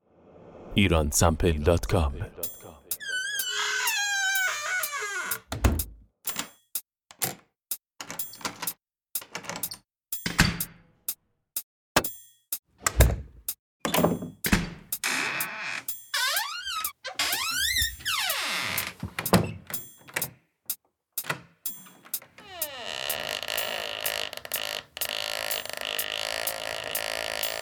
door; Iransample

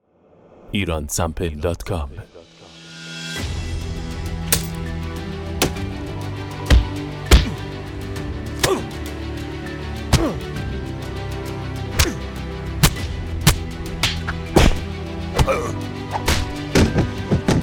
fight; Iransample

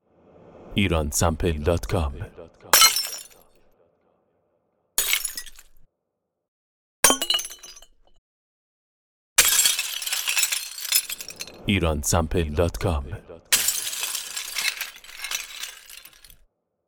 broken glass; Iransample

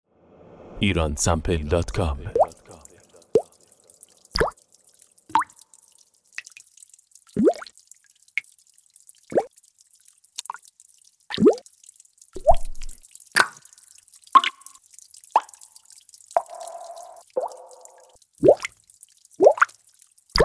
waterdrop

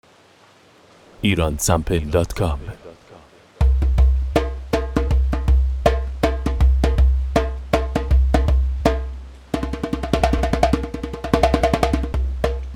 Djembe

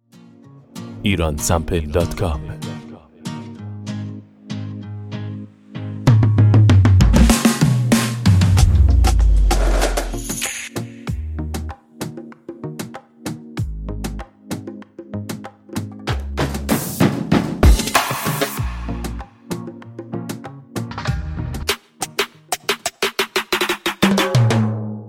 the fills

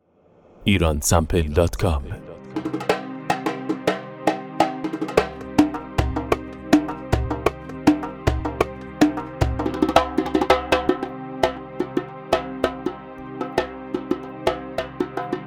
djembe; djembe bandari loops

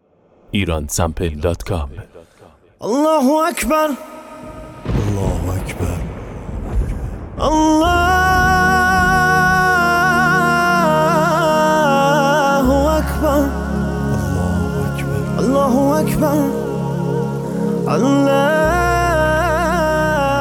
Azan; Iransample

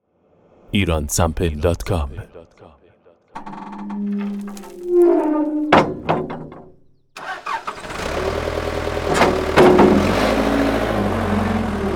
Backhoe; Iransample

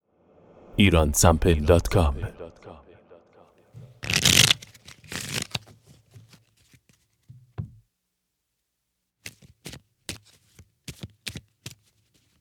play cards; play cart

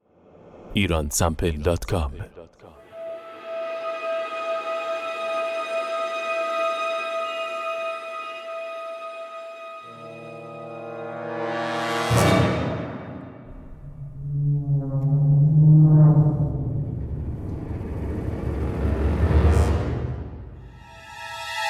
horror, instrumental

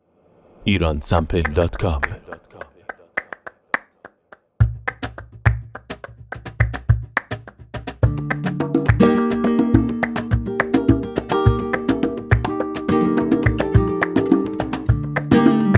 Flamenco, percussion

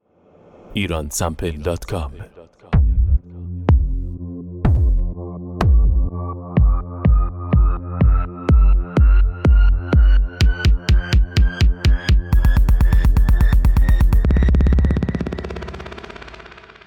kick; audiotent artist kicks

